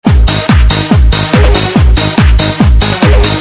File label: Loop